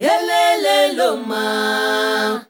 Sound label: ZULU CHR, FEM. CHOIR